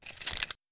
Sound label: confirm